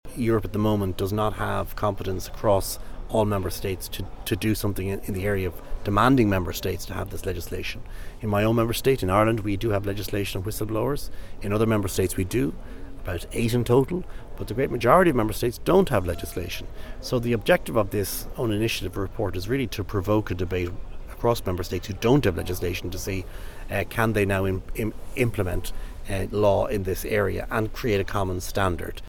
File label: Whistle